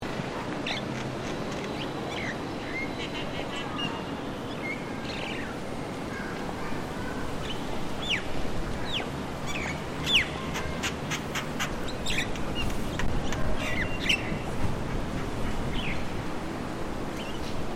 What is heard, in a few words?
Acridotheres javanicus